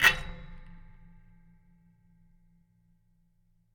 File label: Scrape